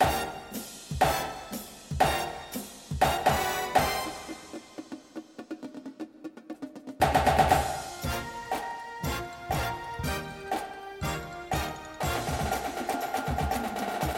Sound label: snare w music